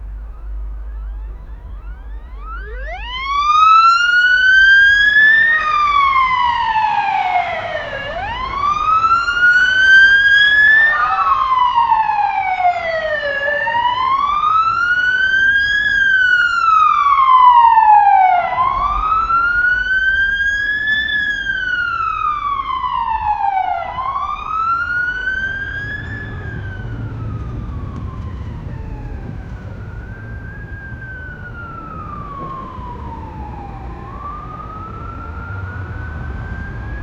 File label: uk police siren faint; vehicles